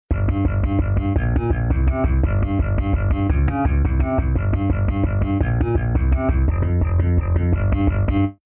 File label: funky thumb